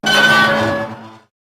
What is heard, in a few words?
gate open